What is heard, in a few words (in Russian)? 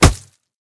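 поражающий хук справа в лицо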